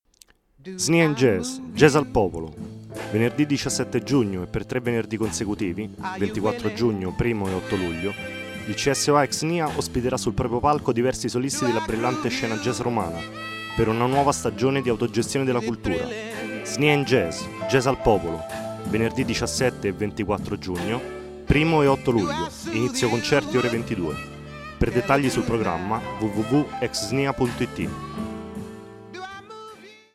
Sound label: jazz